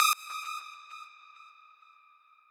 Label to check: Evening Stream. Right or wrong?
Wrong